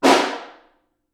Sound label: Snare Far